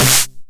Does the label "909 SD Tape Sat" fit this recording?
Yes